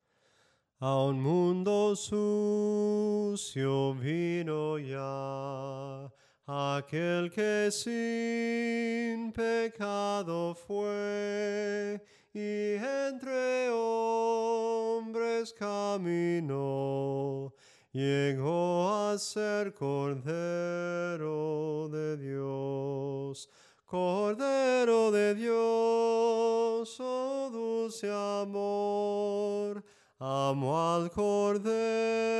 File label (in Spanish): SOPRANO CANTADO, himnos en melodia soprano